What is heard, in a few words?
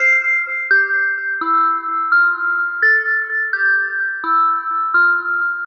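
Percy Bells, drum bass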